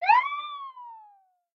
police siren start; Blind Drive

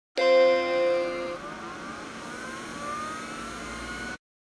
computer; NCH Library

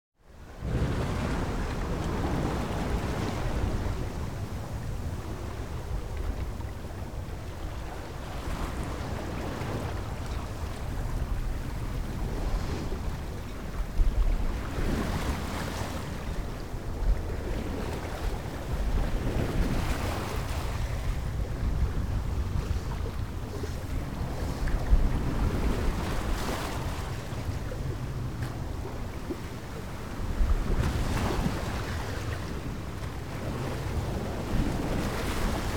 Boat Sailboat General Atmosphere Waves; KMP Film Video Sound Effects

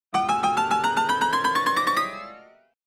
Comedy Piano Chromatic Up - Heavy Climbing; Hollywood Edge; sound designer's toolkit v2

